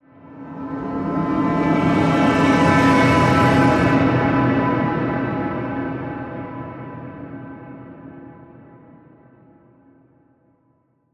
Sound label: Cosmic Orchestra Transition